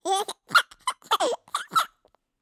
laugh, birthday; CosmicRage

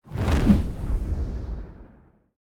fire; CosmicRage; combat; bigtail

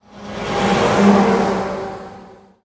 car, highway; CosmicRage; CosmicRageSounds

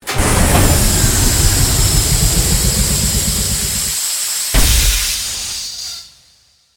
cycle, ships; CosmicRage

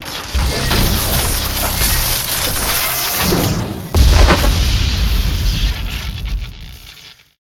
energy ball

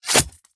flesh, combat weapons; CosmicRage; CosmicRageSounds

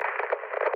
click, telephone; CosmicRage

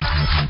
energy deflect, ships combat

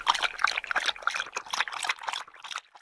pet drink

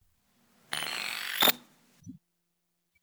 info computer shutdown; comms; Cosmic Rage

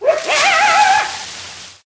fox, hunt; activities hunt

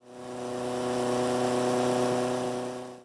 overtake, highway; CosmicRage

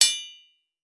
rock, volcano mining

